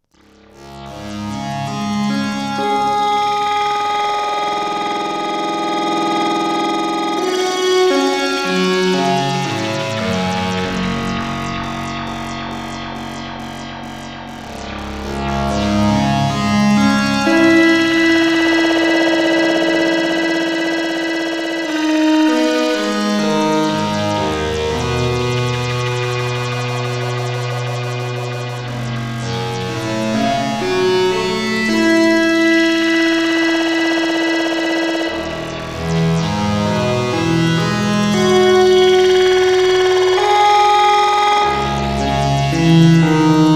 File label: Polymath Spring Reverb